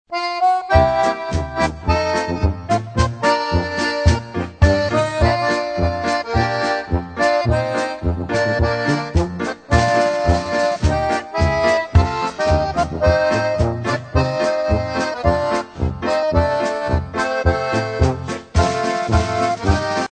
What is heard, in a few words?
THE THREE OF US POLKA